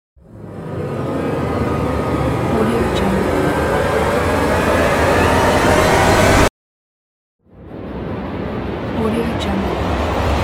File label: cinematic rising tension builder